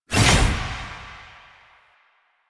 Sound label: Door Close; Warframe; Jade Shadows; Quests; Escape Cinematic